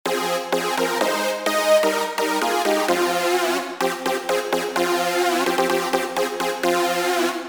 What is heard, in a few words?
Lead; Animal